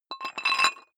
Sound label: dumbbell thread put on weight quick; sport freizeit; fitnessstudio